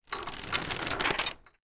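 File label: bridge creak; sfx tanks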